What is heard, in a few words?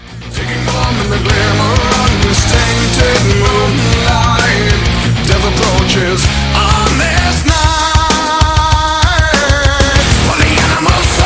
metal; roundsound